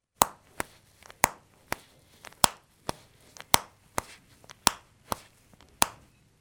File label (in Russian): zvuk zaklepki